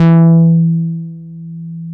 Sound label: MOOG1 BASS E3